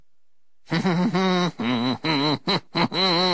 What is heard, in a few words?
victory hum; kefka